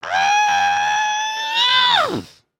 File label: Angry, emotes